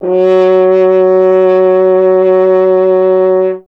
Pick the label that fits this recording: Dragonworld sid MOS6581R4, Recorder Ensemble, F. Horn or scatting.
F. Horn